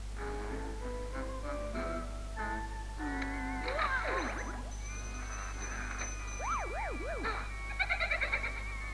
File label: Fake Door Slam